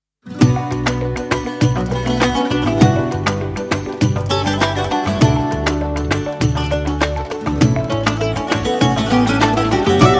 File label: oriental remix